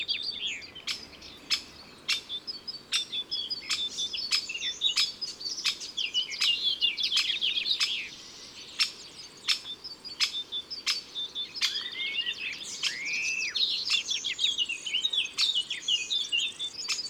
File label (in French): Pic epeiche cris; Isere; Concerts naturels de l'Isere; Studio Les Trois Becs